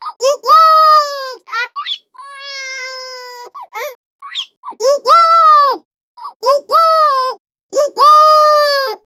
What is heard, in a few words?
a bubbly 3 year old child